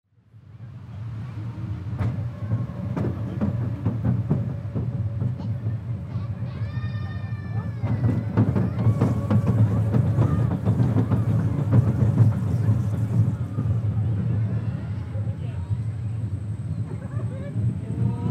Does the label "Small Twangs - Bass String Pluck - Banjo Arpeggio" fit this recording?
No